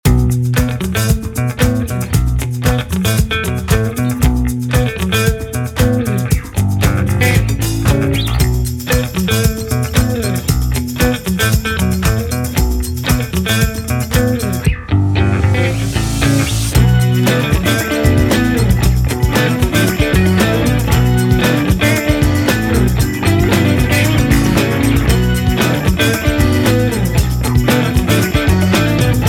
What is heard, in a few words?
vintage funky time medium